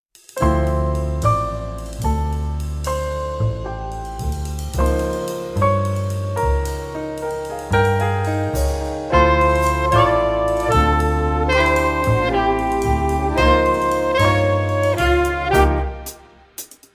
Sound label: Samba Batucada